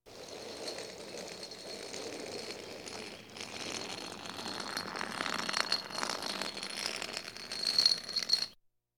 Police, Fire engines

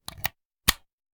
Hand Gun Foley; Sound Ideas; Guns

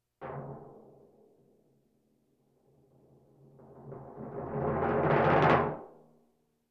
Percussion Bongos Tympani rolls Gongs Drums

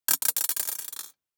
DICE on Glass, Throw and Roll, Mini, 3 Three Dice; Samples; ArticulatedSounds; DICE Sfx Party (Extended)

